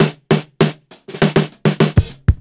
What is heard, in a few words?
drum fill; hanami